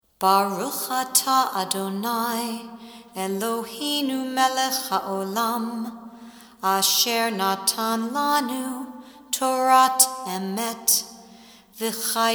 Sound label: blessing after chanting torah; ramah